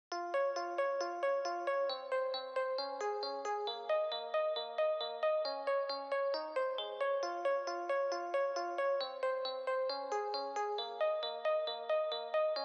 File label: 80s synth